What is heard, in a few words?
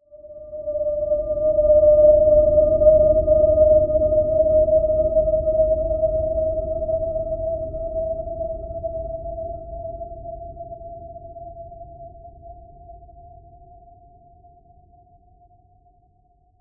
Ethereal Crystal, Swell, Airy, Eerie; BlastWave